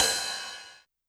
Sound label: Ride Hard, YAMAHA RM1X